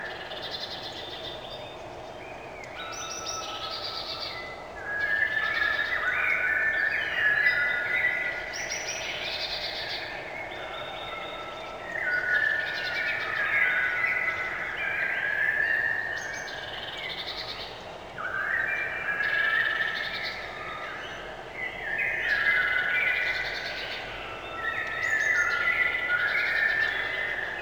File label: Wetland Forest Day Amb Loop